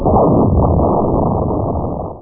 Hit Mine; Mac Mine Sweeper 4.1

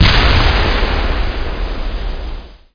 at4 law; PC Gamer IT CD 32; SPECOPS

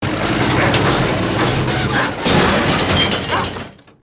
SALVAGE, buildings

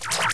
Clicker

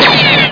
LAZER GUN

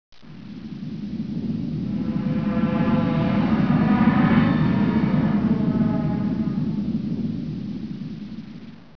SCRAPE

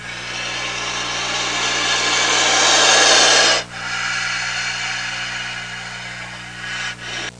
cobra hiss; Sound Effects for Multimedia - Volume 1; animals various

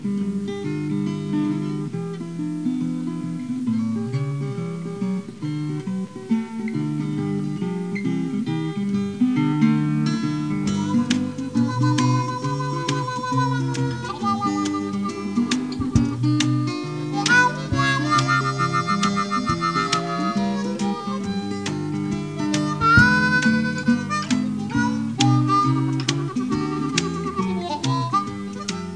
MUZAK; R. Crumb Screensaver & Companion

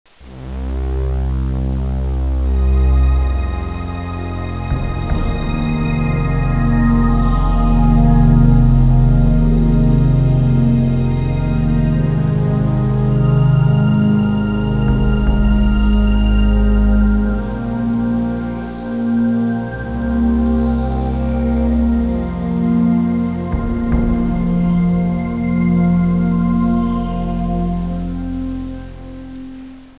SLIDE

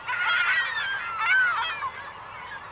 GULLS, water; zones

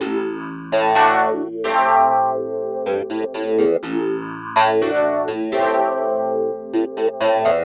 SYNTH HOUSE 125 A; eJay; Keys

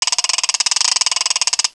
crank

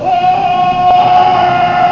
yell; FinalOdyssey

